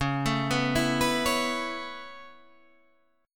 C sharp Minor 7th Sharp 5th C sharp Guitar Half Step Down; C#m7#5